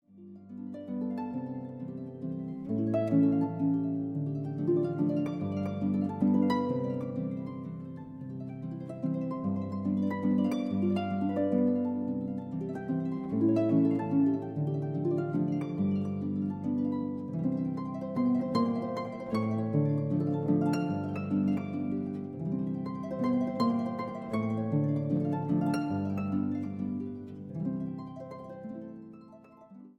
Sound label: Harp; Balsir Chatterton; Santa Lucia